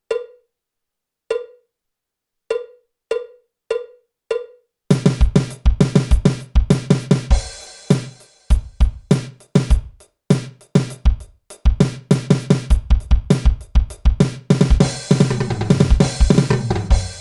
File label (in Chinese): 架子鼓独奏 爵士融合; 66条架子鼓独奏SOLO